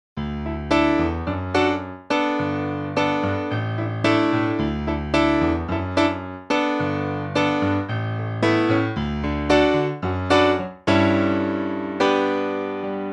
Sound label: Studio Grand